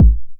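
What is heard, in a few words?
909 BD tape, kick drum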